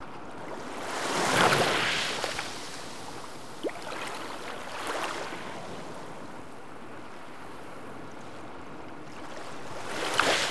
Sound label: Sea, Mediterranean sea, small beach ambience, close light waves, motorboat; Baia Ostina; lilesound